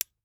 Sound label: Lamp On